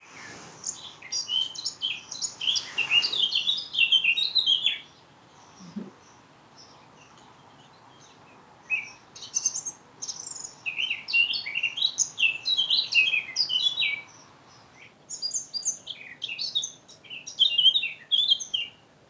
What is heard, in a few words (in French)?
Fauvette à tête noire